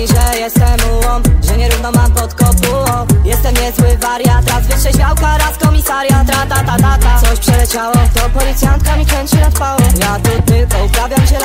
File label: nightcore